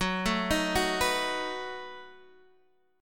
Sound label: B 7th F sharp